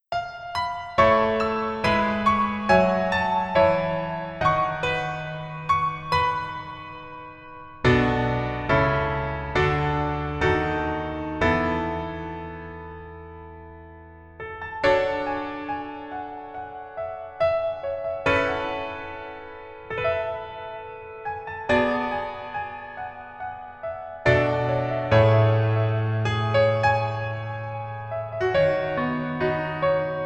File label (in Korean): Piano ver 멜로디MR 여자키